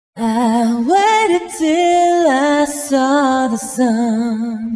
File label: voice